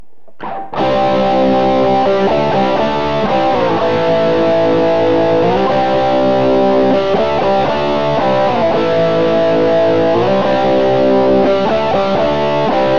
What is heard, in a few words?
bridge crunch; Burny